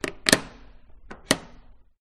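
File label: exterior door handle; detunized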